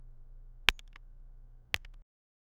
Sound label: catching a ball in hand; sport